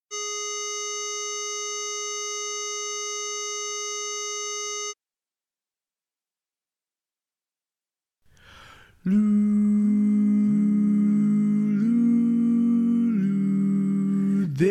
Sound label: bari right; baritone